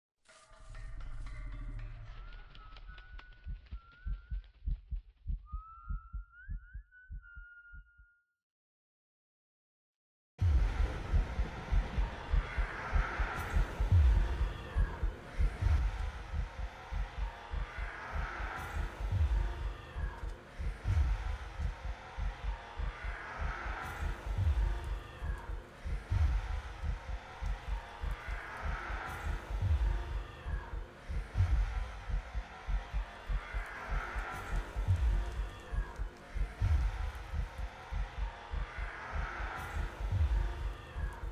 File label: menu music; horror; Minecraft; Cave Horror Project - CHP1